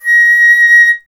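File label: flute A#; Miroslav Vitous; Solo Instruments VOL-2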